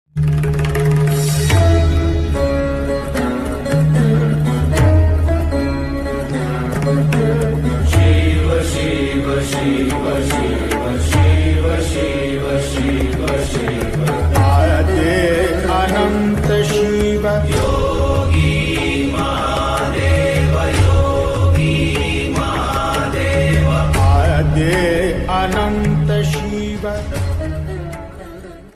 marathi ringtone; devachi ringtone

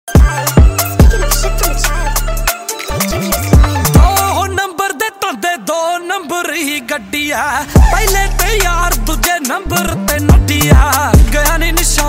punjabi song ringtone

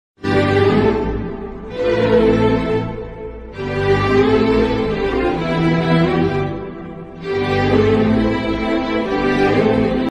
Love BGM; gautham meets aishu

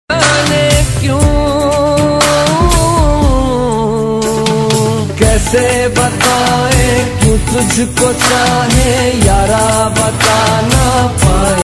romantic ringtone, love ringtone, hindi ringtone

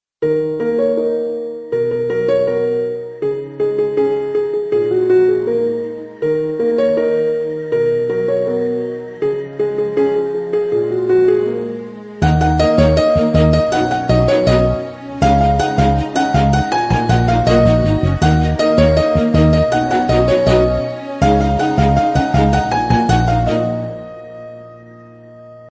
song bgm ringtone

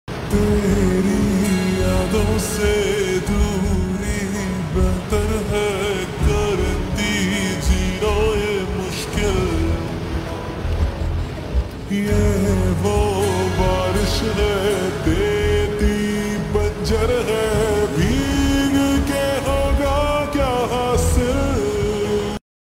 lofi slowed

